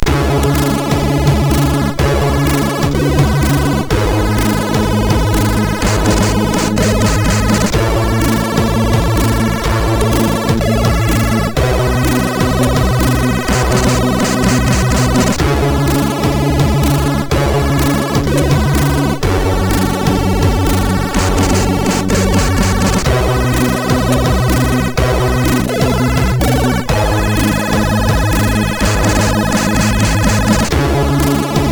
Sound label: Hawkeye loader, MOS6581R4; sid